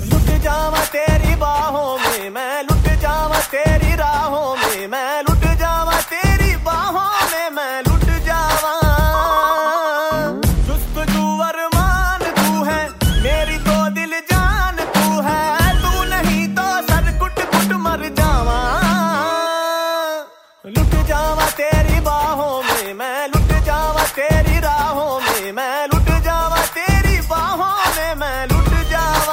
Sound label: Hindi Song